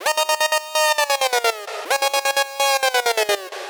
Gated Synthline (130BPM); musicradar

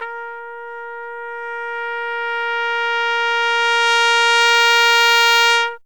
trumpet; Zero-G; Phantom Horns; CRES.TRUMPET

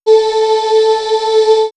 Swirls Breath, pads; X-Static Goldmine

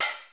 cymbal, Roland MC-909

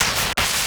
Glitches; Antidote Advent

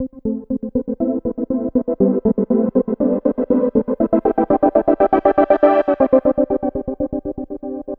Trance Synth

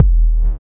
BUZZER; Spectrasonics; Metamorphosis

